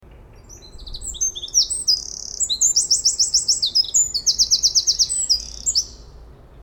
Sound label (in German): zaunkoenig